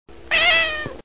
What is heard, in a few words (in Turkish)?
kedi miyav